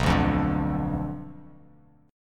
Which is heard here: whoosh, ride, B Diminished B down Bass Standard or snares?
B Diminished B down Bass Standard